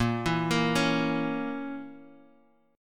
A sharp Diminished Guitar Half Step Down, chord

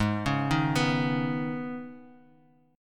C sharp 7th Suspended 2nd G sharp

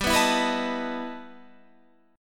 E Minor Major 11th G, guitar

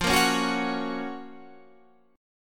Gb Major 7th F, guitar

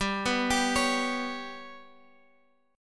G Major Flat 5th Guitar Open F, chord; GMb5 arpeggiated; JGuitar

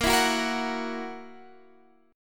E Suspended 2nd Flat 5th Bb

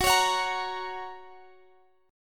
Gb Major, Guitar Half Step Down

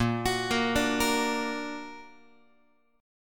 Bb Augmented Bb Guitar; arpeggiated; JGuitar

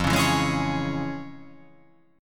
F Minor Major 7th Sharp 5th

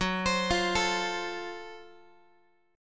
Gb Minor Major 7th Flat 5th, Guitar, chord; arpeggiated; x,14,15,15,13,x; JGuitar

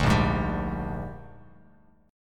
A sharp 13th chord, Bass Standard; strummed; JGuitar

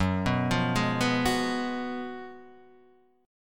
F sharp Major 7th Suspended 2nd Suspended 4th; F#M7sus2sus4 Chord {2 2 3 1 0 2} arpeggiated

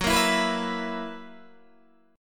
Gb 11th, Guitar, DADGAD

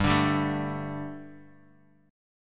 G Suspended 2nd, Guitar, Standard; strummed; 3,5,5,2,x,x

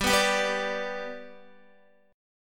G Major, Guitar; G strummed; JGuitar

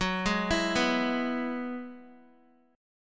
Gb 7th Suspended 2nd Suspended 4th, Guitar; Gb7sus2sus4 arpeggiated

